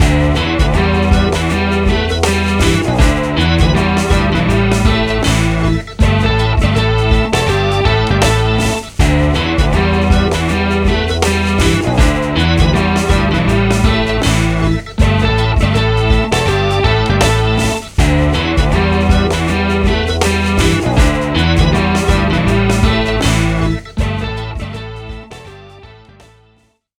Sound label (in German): Gitarrig